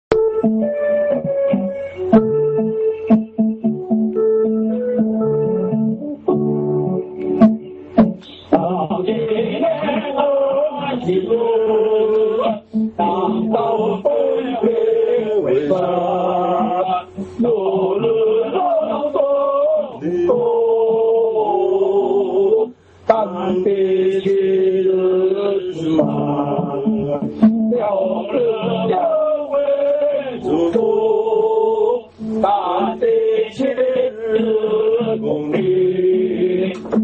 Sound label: hymn; worship